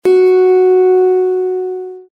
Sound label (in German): Zithermacher Ton 8 LAUTER